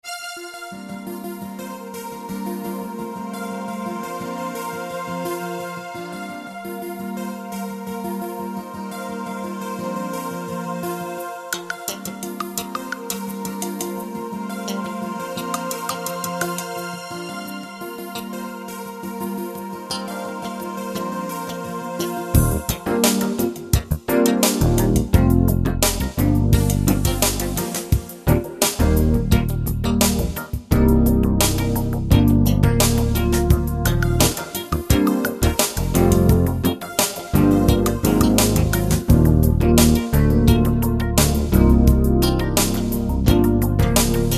korg triton

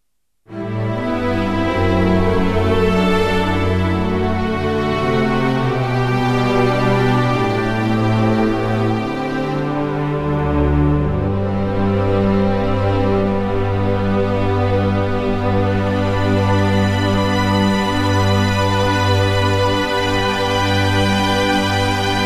yamaha ex

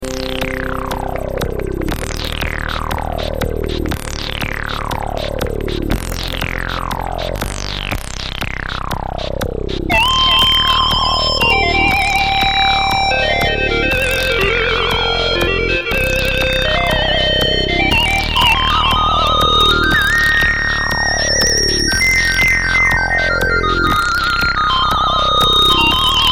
crumar bit 99

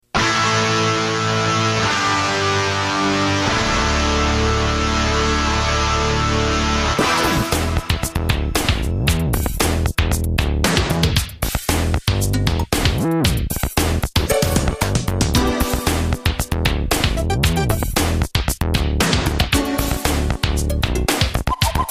roland u220

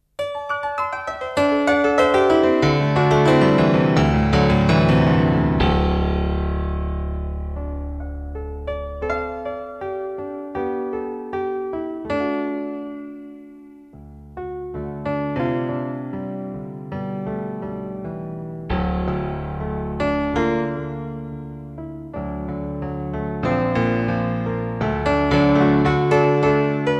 yamaha sy85

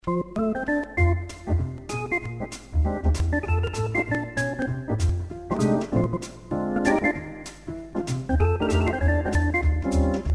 technics kn5000

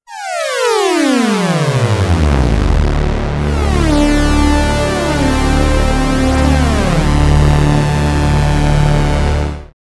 prophet 600

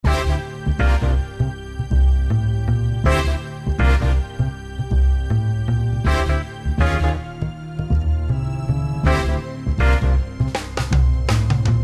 akai s6000

